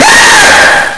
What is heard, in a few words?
scream; slender